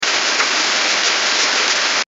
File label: rainfall